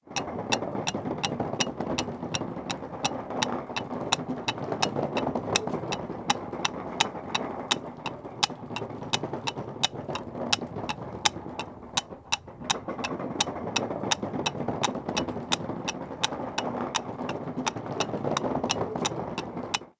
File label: Stage Coach Simulation